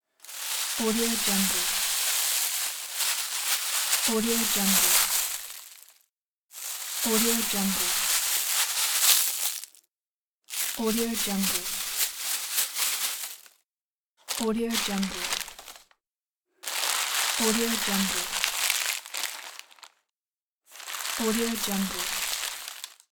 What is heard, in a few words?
plastic bag